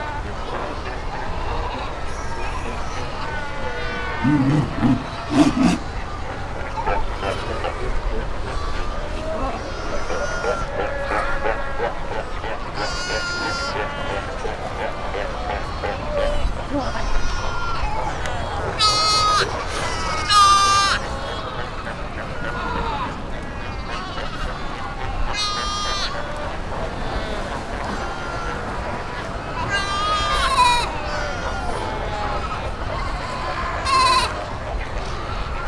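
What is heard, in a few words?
fur seal rookery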